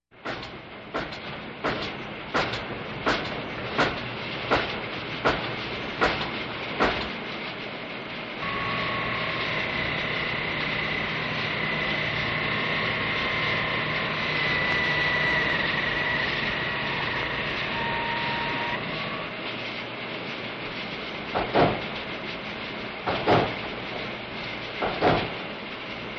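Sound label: General construction site background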